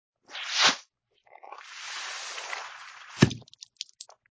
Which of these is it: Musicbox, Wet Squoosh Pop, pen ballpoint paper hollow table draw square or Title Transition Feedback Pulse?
Wet Squoosh Pop